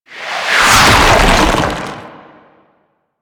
Sand Spawn; Warframe; Man In The Wall; Enemy Gameplay

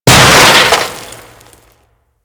Shipyards Debris Crush; Ambience; Props; Warframe; Grineer Shipyards

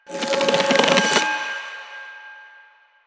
Choir Bow Charge; Warframe; Weapons

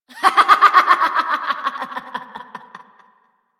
Laughter; Warframe; Entrati Doppelganger; Evil Twin

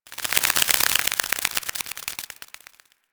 Forest Butterfly Flaps; Ambience; Warframe; Grineer Forest

